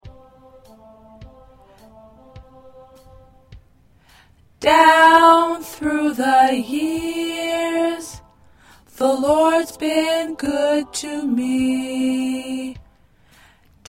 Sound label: Alto